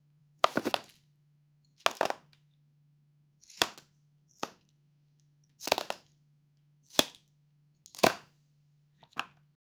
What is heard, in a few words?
picking up a small plasti; interaction